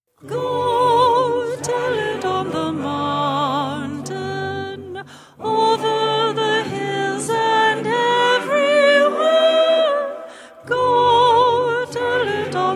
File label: go tell it mezzo